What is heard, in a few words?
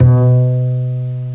JAZZY ACOUSTIC BASS C2; Bass Hits